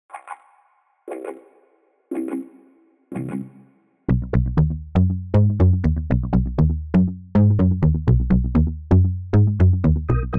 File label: MIDI blues